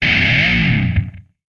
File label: Music E Guitar Disto